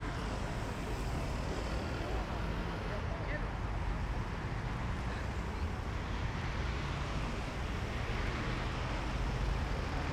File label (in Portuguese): Muito transito com poucas pessoas